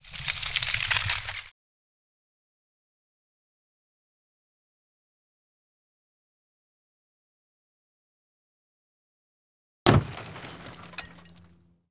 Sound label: ICECUBE TARGET